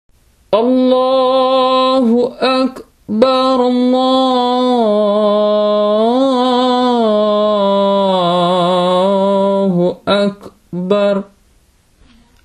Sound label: Adzan